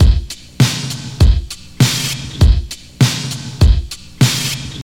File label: 100 bpm breakbeat sample A key, kick tuned to the A note; drum loop